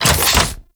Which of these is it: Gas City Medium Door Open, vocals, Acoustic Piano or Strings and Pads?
Gas City Medium Door Open